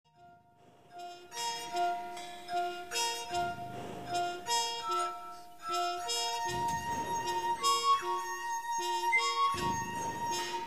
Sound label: canto de pilon